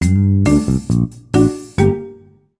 Jingle; Hot Shots Golf: Open Tee; PSP

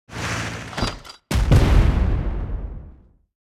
Skill Bombing

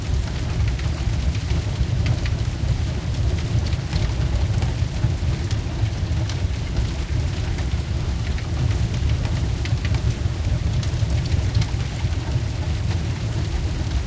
Fire Loop; gamerip; Mars Sortie

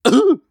Maggot Bounce; gamerip

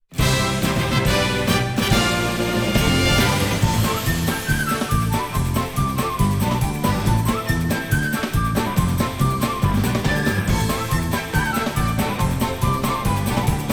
Battle Result; Bravely Default Brilliant Lights

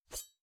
woosh

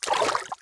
Swimming Splash; MiniGames

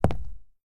Footsteps Concrete Shoes; FX Oneshot Footsteps

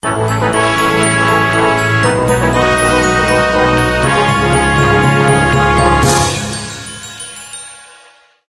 UI Mission Success; Valthirian Arc Hero School Story

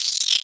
Insect Vocalization Chirp; Frogsong

